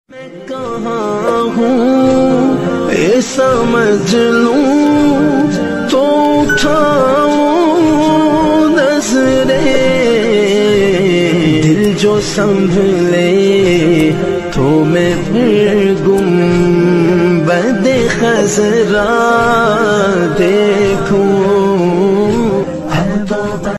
Islamic Ringtone

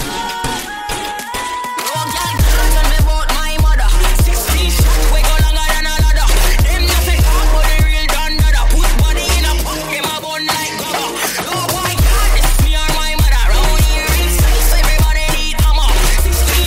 Remix Ringtone; ringtones

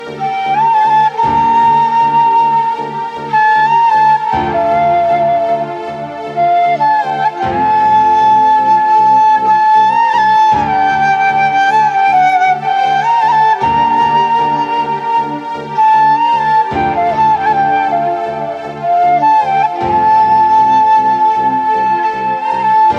Bansuri Flute Ringtone; ringtones; Dhadak Movie

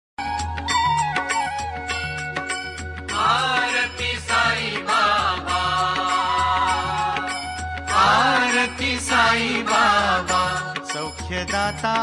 Aarti Bhakti Ringtone

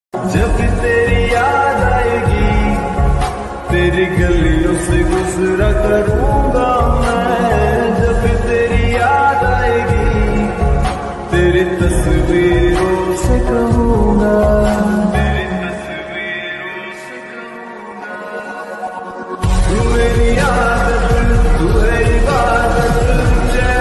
Hindi Ringtone

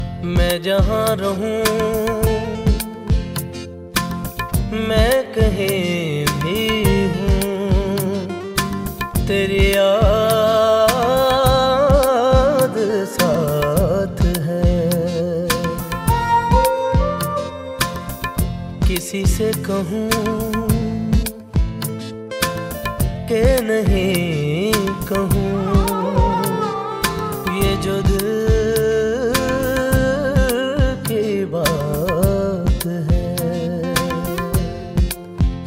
Hindi Song

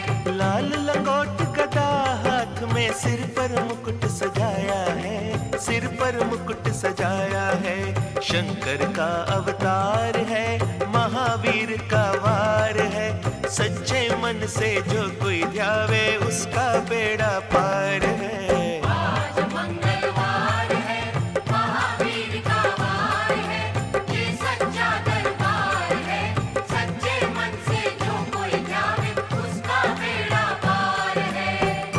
Bhakti Song Ringtone

